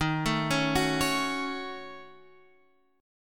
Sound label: D sharp Minor 7th Flat 5th D sharp, down, Guitar, Drop D